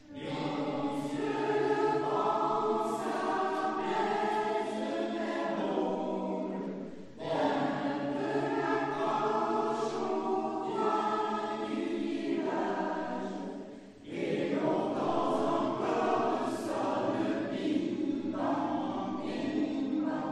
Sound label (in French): chant du soir